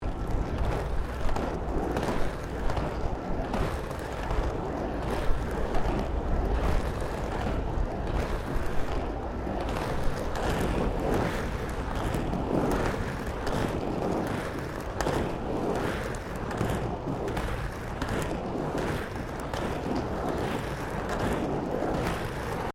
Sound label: ROLLERBLADING BITUMEN SMOOTH FAST LOOP